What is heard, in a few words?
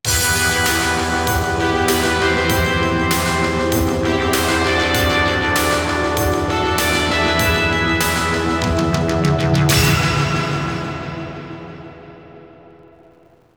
Str G#